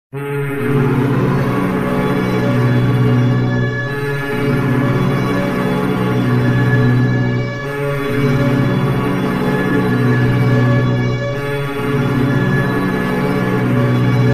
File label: goal horn old; new york islanders